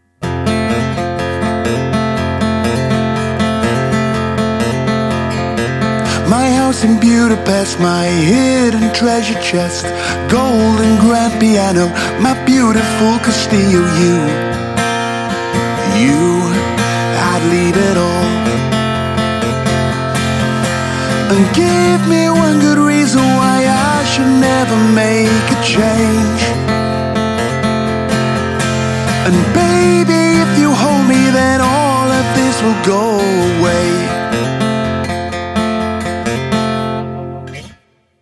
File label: Acoustic